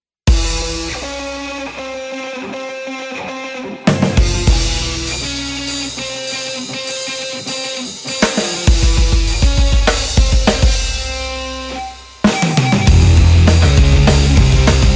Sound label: metal jbass drums; machfive; metal telmatic